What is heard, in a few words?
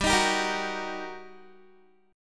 G Minor Major 7th Sharp 5th, G, down, Guitar, 4E 4A 5D 5G 5C 6F; GmM7#5; strummed